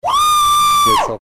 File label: woooo